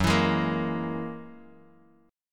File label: F sharp 9th F sharp Bass Standard; F#9 strummed